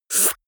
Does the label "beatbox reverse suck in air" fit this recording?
Yes